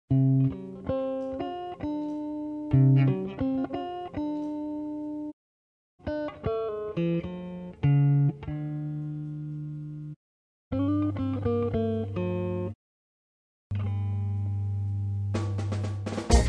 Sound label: rumba; ultrabuketten